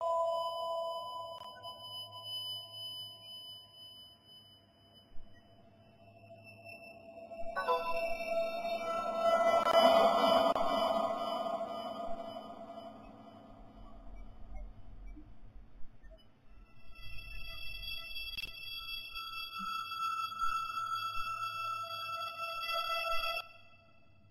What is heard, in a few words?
Signal